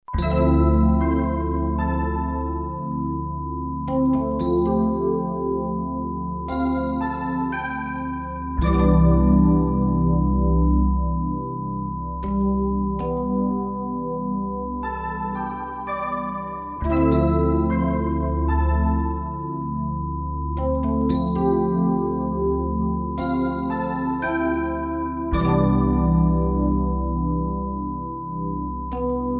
MUSIC BOX

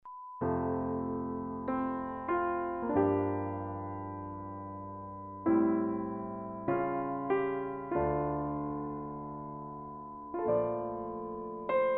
artless piano

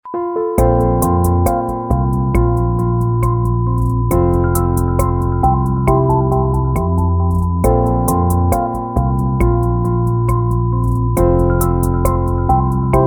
air groove

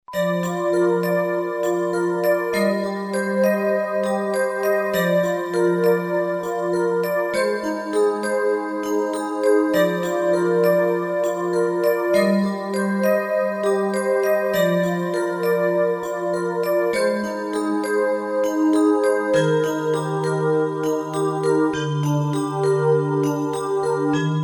MUSIC BOX

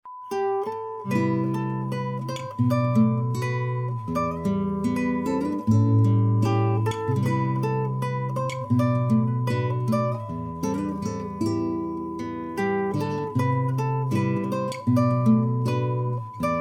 music on guitar

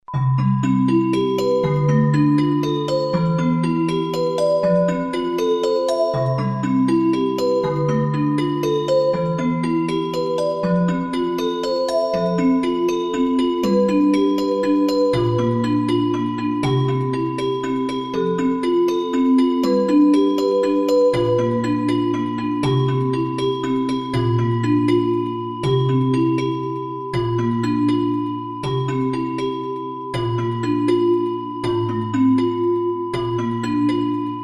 MUSIC BOX

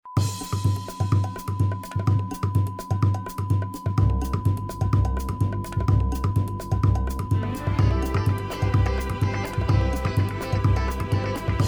music on guitar

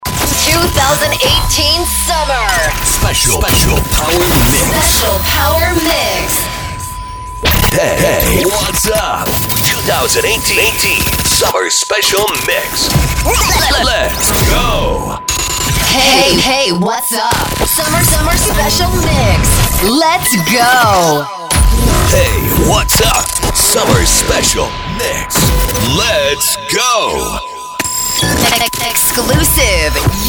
Summer Mix Jingle

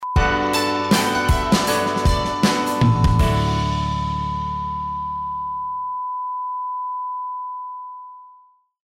Jingle

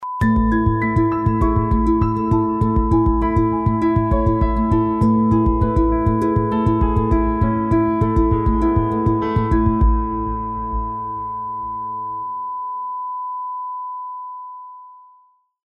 Jingle

